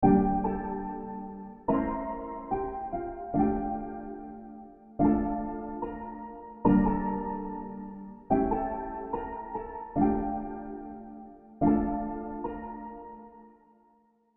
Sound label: Levitate; Key; trap vst